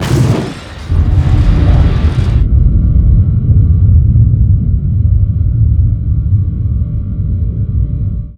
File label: EXPLOSION, Disjointed Textures; USB Soundscan vol.33; AKAI; CHAOS 1